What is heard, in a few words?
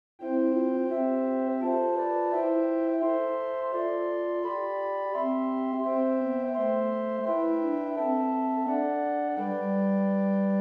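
Great Clear Flute 4; St Annes Moseley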